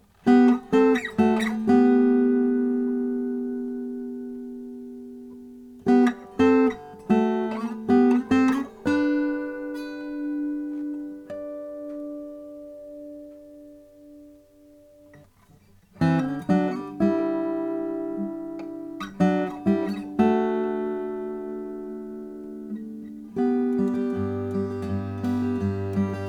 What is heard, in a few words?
doolin om madagascar rosewood